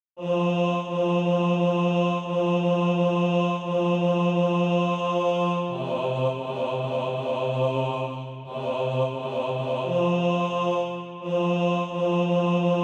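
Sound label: bass, Sheet music (SATB) including Practise Music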